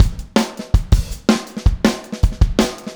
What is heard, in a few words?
Full Mix, Drums, 162 BPM; Verse